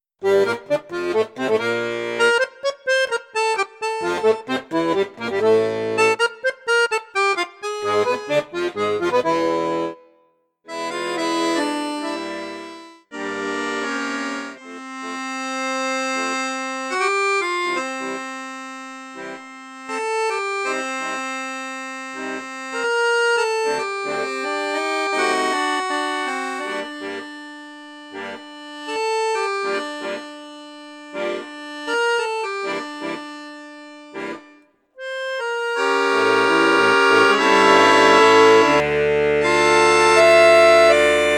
registre basson flute juste, accordéon; mengascini f11

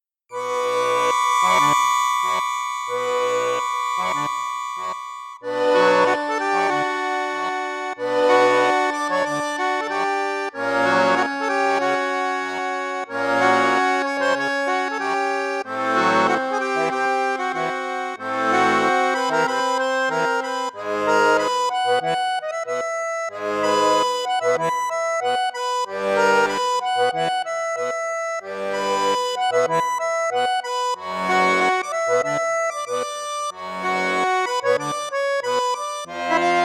registre basson flute juste, accordeon; hohner artiste ii s

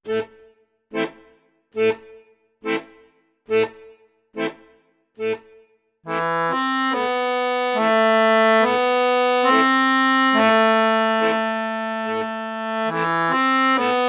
registre basson, accordeon; perle d'or

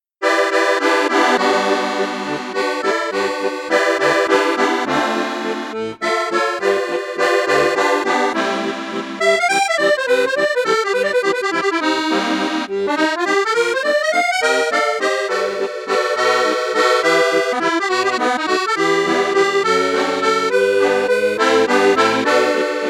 registre flute juste flute haute flute basse, fratelli crosio super musette accordeon; fratelli crosio super musette 3

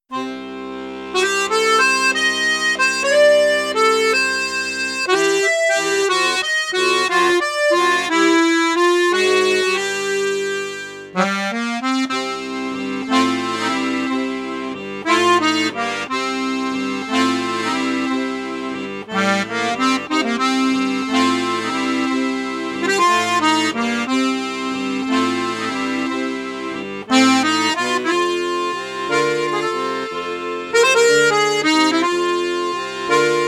registre flute juste flute haute, accordeon; maugein octavia

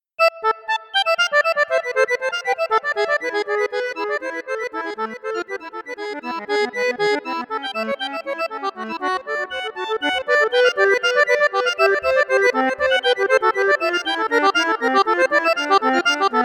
registre 16 4; borsini bayan

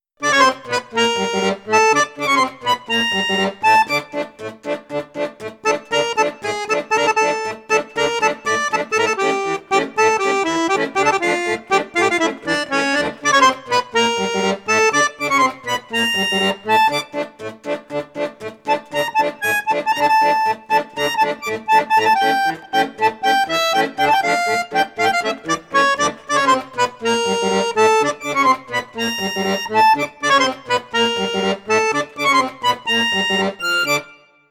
registre flute juste piccolo, accordeon; crucianelli super king

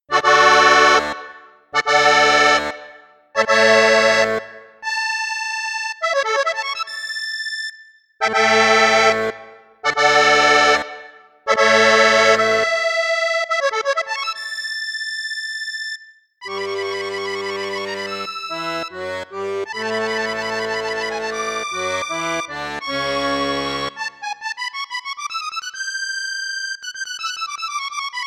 registre flute basse flute juste flute haute, accordéon; piermaria 318 l; registre 3 flutes

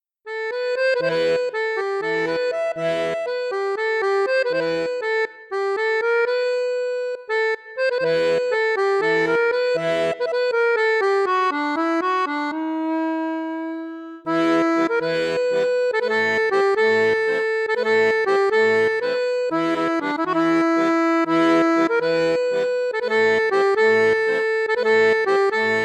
registre basson; maugein mini sonora

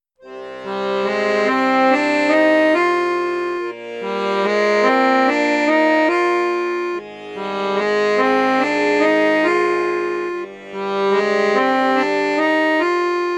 registre basson, accordéon; hohner vivaldi

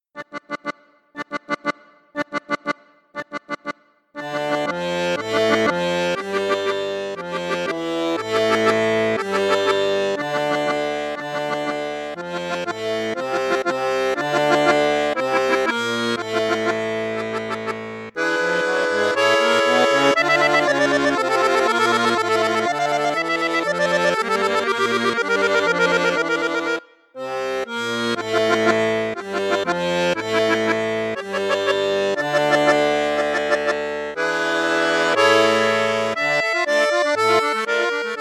registre basson, accordeon; piermaria 312

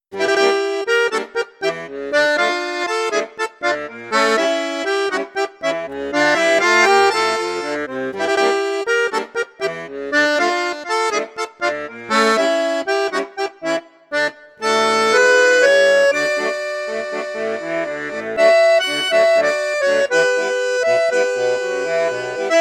registre basson flute juste, accordeon; perle d or

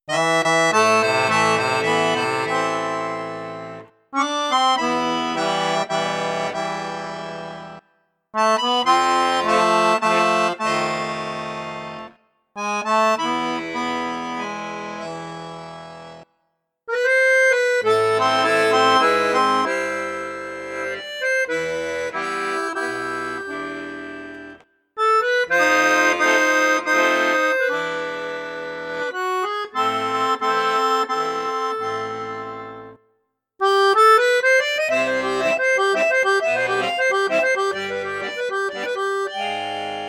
registre basson piccolo, accordeon; pigini star jazz

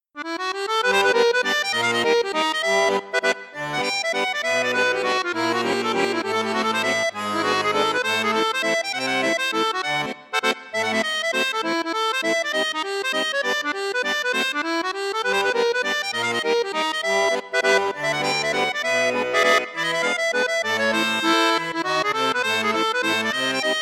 registre flute juste, accordéon; cavagnolo royal musette

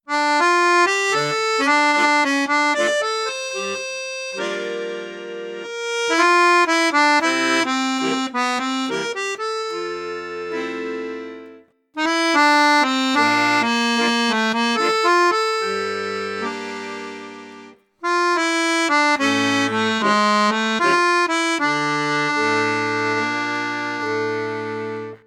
registre flute, accordeon; maugein festibal

